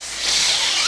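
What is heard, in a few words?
sith lightsaber off; weapons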